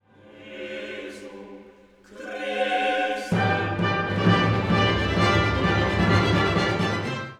Gloria Jesu Christe fanfare

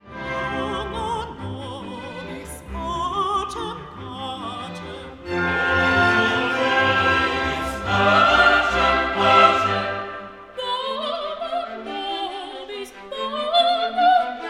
Dona nobis pacem Its a waltz

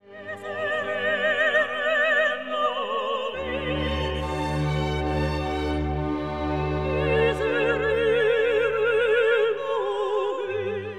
Agnus Dei Duets

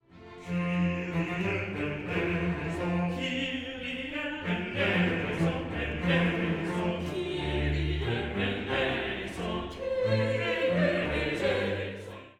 Kyrie Chorus Main

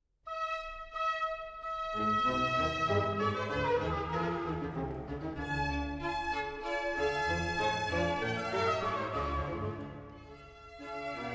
Benedictus Orch intro

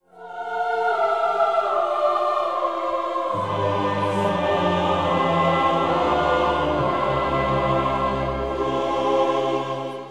Sopranos Altos Chorus; Sanctus

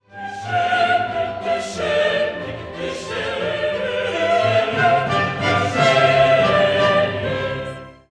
Credo Descendit

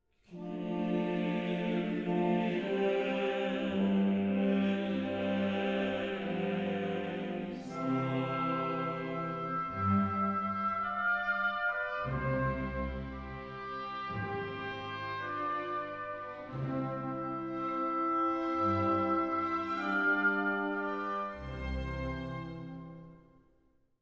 Kyrie Chorus prayer

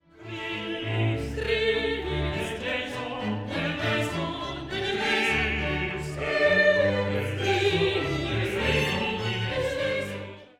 Christe

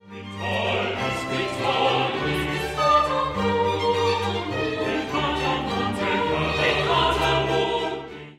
Gloria Qui tollis

